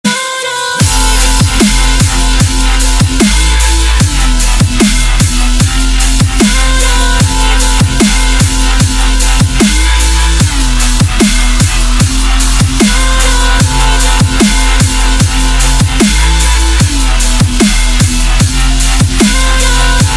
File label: Dubstep